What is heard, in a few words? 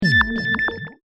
Demonic Heartbeat; Monster Sound Effects; fantasy sound effect; Andrew Fleming; Twistedtracks